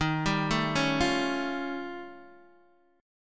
Eb 9th Flat 5th, Guitar, Open D

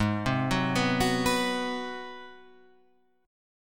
Ab 7th Sharp 9th down Guitar Open F; arpeggiated

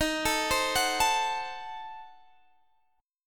C Diminished 7th Eb, chord

